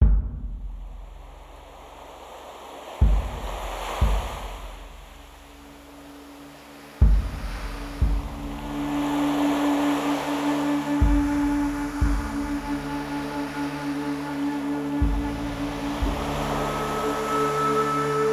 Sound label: Dancing Waves 285 Hz